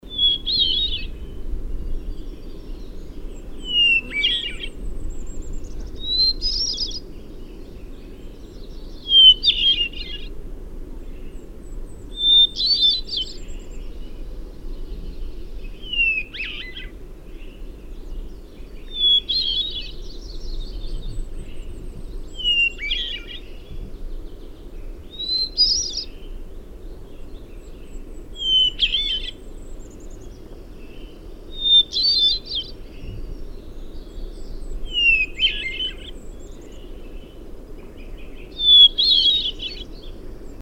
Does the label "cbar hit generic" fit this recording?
No